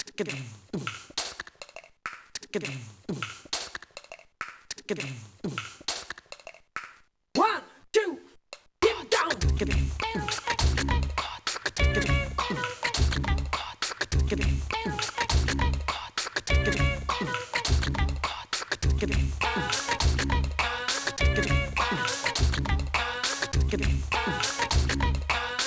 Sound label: Accapela; Digital Sound Studio (DSS)